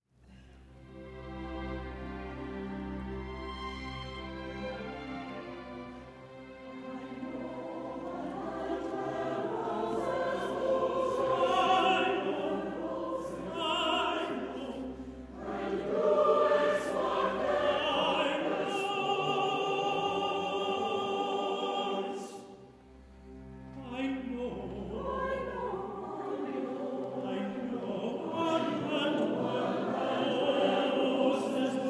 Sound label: chorus